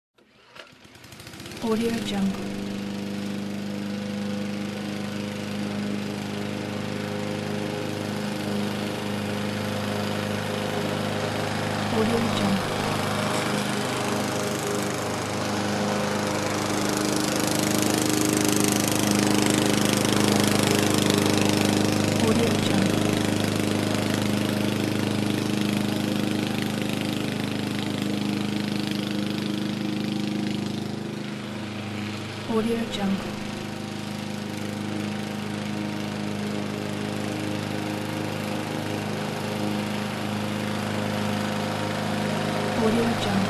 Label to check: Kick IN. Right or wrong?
Wrong